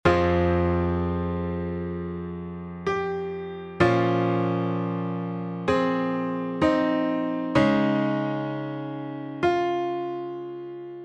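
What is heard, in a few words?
chord progressions Edm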